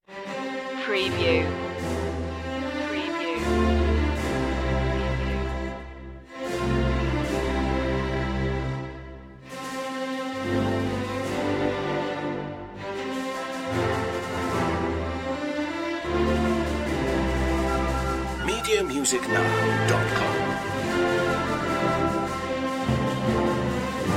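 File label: main theme low intensity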